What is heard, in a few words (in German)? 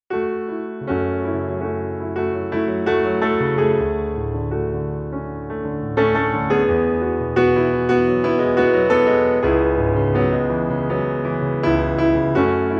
Pianist Piano Klavierspieler; ModernJukes; Hannover; Hochzeit; Empfang; Swing